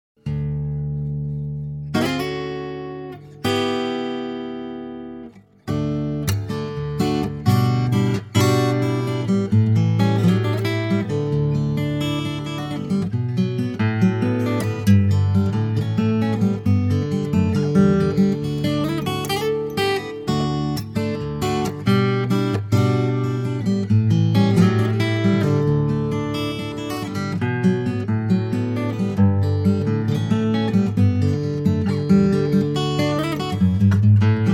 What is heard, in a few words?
Guitar